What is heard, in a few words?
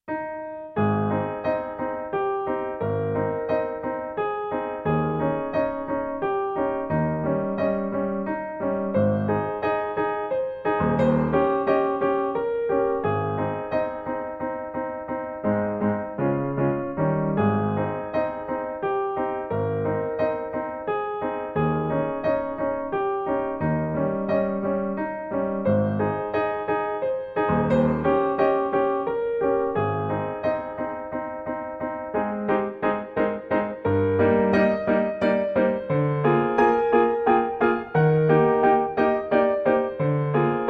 La Cloche, piano; piano-lesson; burugmuller; 18etude